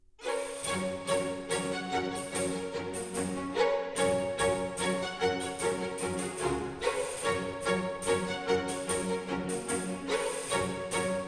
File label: Tambourins (Rigaudons); Rameau; Suite from Platee